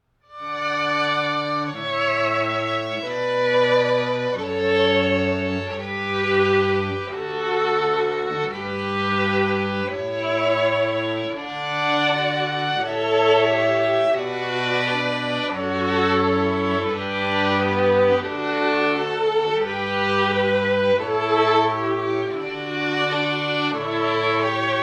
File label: Strings Canon in D; Quartet